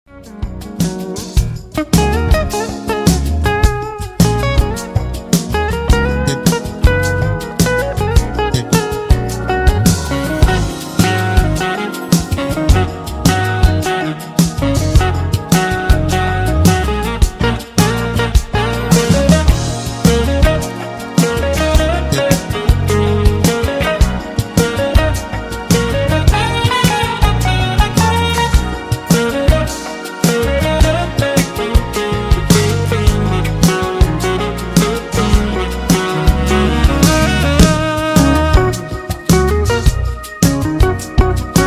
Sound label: shuffle